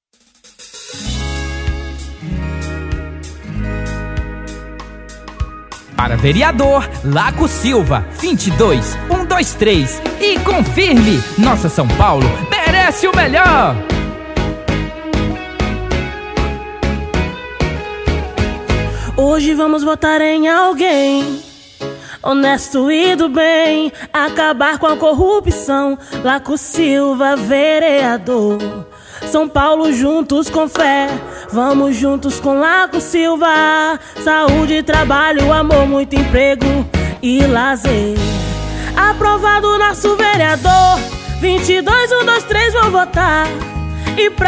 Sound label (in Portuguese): parodia reggae jingle politico; Para Vereador E Prefeito